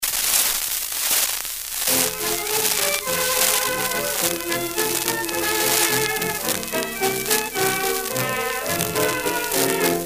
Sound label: Mono